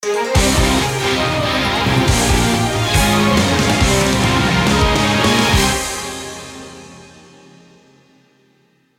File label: vs screen jingle; bbcf